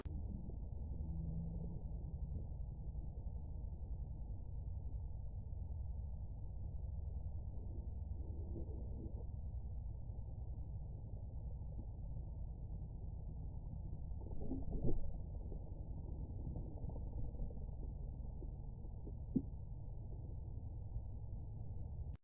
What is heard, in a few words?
woodpeckers